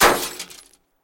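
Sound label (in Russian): короткий металлический негромкий звон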